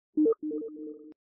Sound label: steam